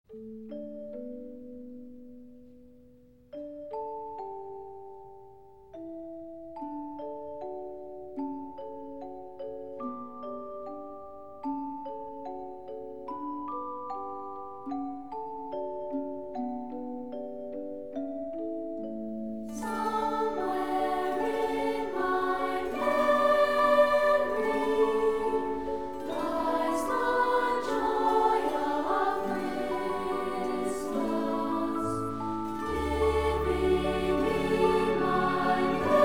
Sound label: Chorus Orchestra Version